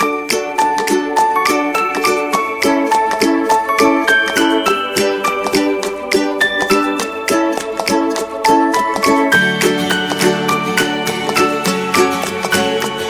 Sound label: Game Folk; happy music vol 7